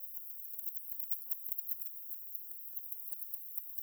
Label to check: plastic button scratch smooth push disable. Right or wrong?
Wrong